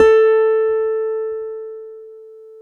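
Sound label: GTR A4 STIC, KEYS AND GUITARS; Synclavier Sampler Library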